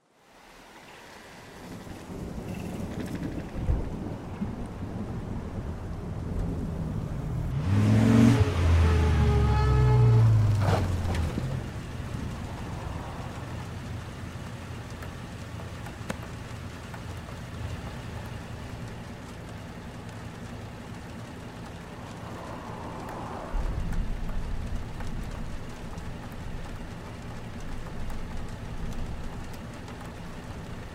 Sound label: school rainy day; life is strange